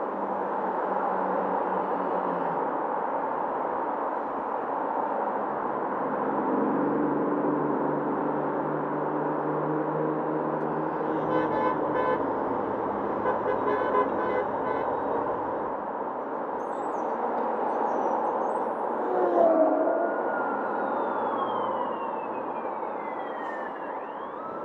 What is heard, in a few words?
City Sounds Soundscape